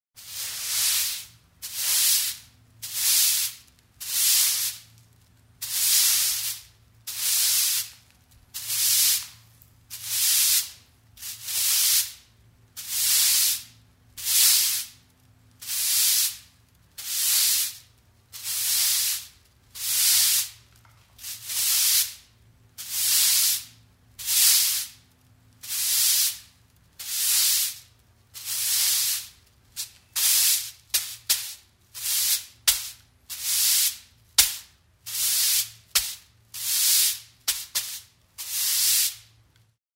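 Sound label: sweeping with a broom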